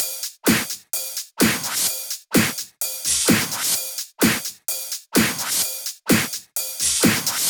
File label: 128BPM Machine Kit